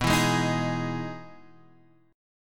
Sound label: E Major 7th B, chord